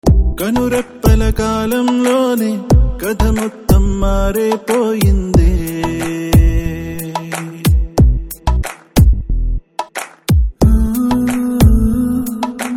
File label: BG Humming Tone Telugu Ringtone